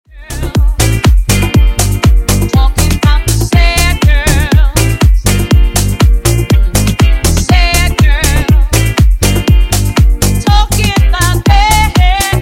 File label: Disko Funk